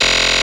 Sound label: SE GAME BREAK; maimai